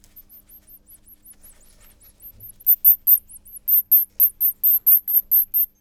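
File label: Diplomesodon pulchellum squeaks juvenile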